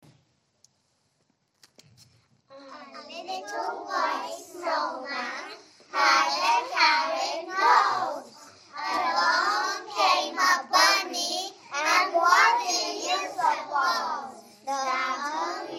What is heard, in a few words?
little white snowman